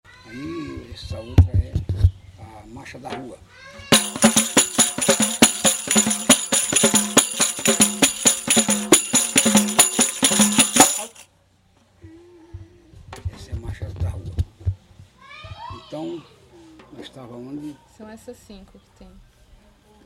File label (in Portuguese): marcha de rua